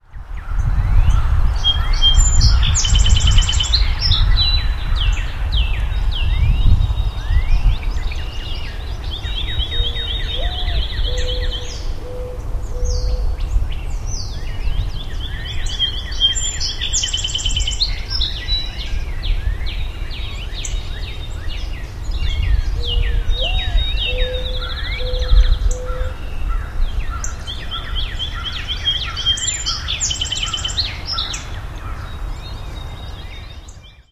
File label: song sparrow